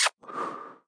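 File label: new mail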